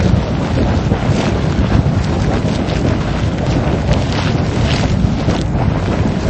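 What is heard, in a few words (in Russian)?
лава хрустящий резкий удар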